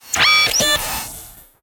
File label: interface beep, Sci-Fi Interface; BlueZone